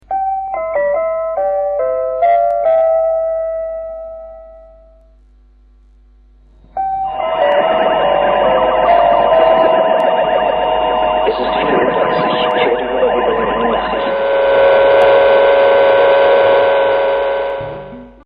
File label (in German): Rias Berlin stoersender; pausenzeichen